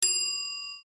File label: good Catch; disney; best frenemies forever